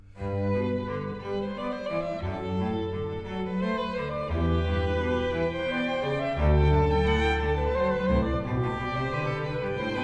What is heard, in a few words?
Variation 3 Canone all'Unisuono, strings; Bach; Goldberg Variations; Sitkovetsky; NES Orch